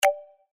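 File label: Button Click; Oriental Ink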